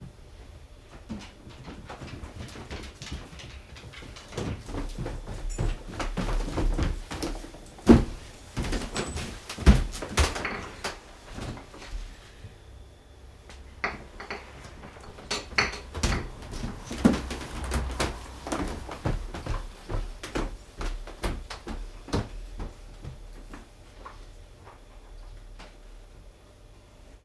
Steps Wooden Floor Walking Upstairs and Downstairs from inside a room; london music mixing